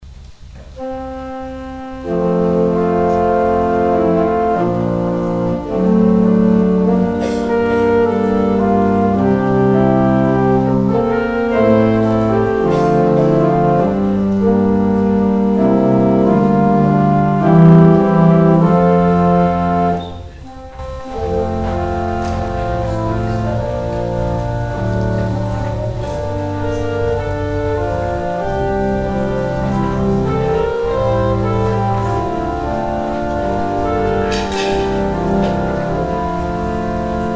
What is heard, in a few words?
organ interlude